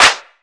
Ice Clap, Dubstep; Ghosthack - AC2024 - Day 13 - Dubstep Sounds 2025

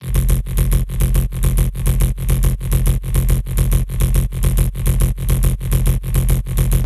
Bass Loops F 140 BPM; PSY; FL Cloud

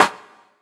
Snare, Afro Dancehall; Afro Drums; One Shots; Ghosthack; Drum Mega Bundle Demo

